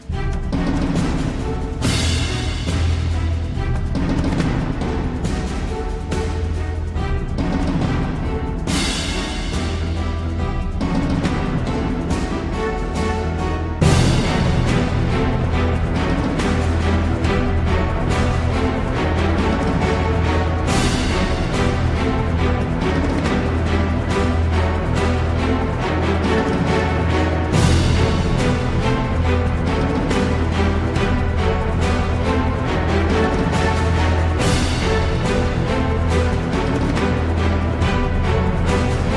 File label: fight; the lord of the rings aragorns quest; ps2 ps3; Midgewater Marshes